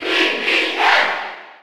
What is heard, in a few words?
Cheer Spanish; SSB4